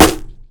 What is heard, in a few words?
ceiling tile impact bullet; physics; plaster; Counter-Strike Global Offensive